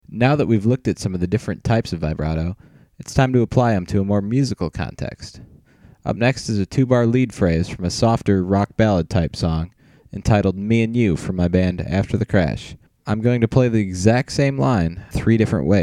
vibrato

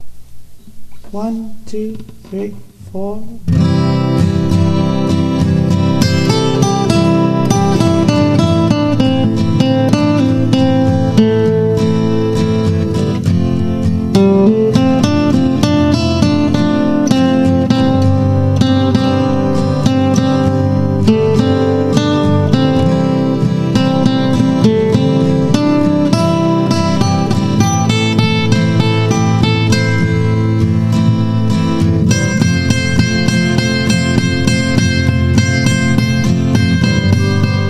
SOLOING, guitar

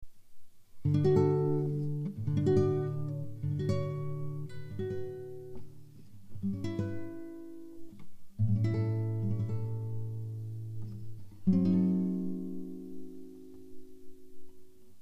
BELLS

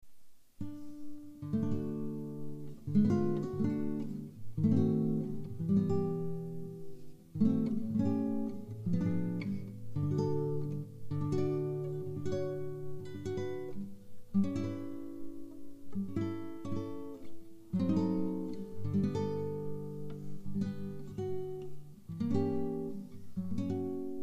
BELLS

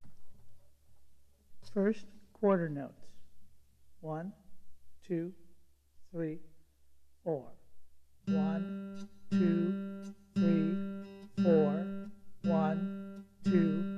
SWING, guitar

